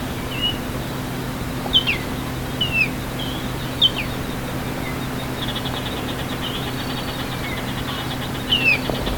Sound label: altamira oriole, birdsongs, belize